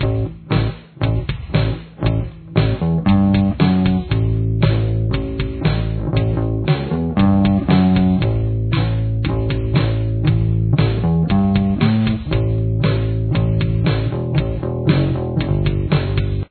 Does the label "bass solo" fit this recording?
Yes